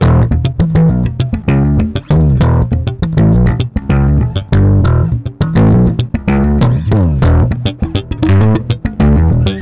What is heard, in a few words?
Electric Slap fuzz